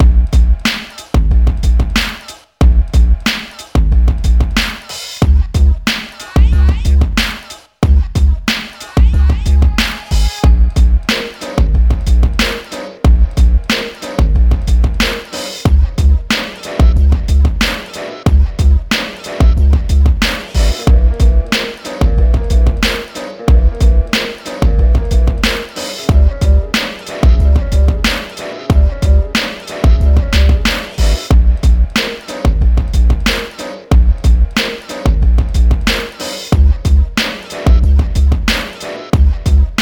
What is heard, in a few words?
Instrumental